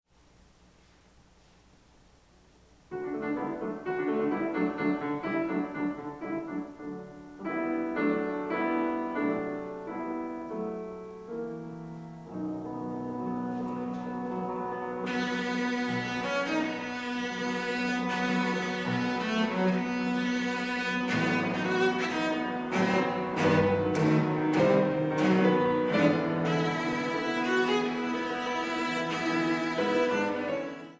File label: Cello Concerto in B Minor, Allegro, LIVE; Dvorak; Op104 I